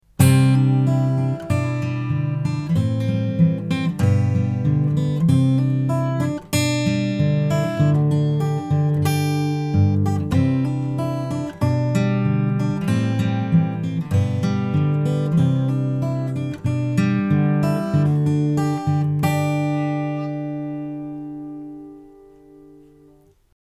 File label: fingerpick